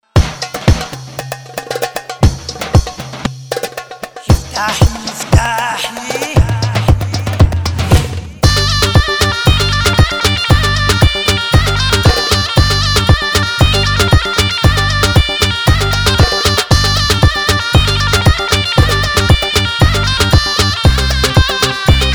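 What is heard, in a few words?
instrumental, Israeli Dances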